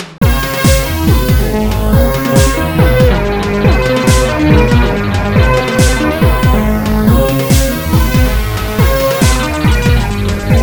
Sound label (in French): synth batterie